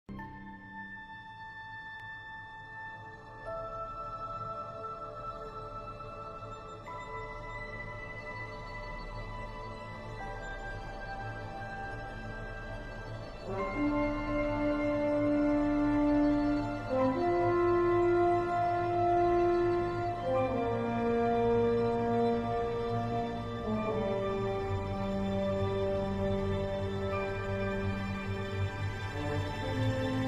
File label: disco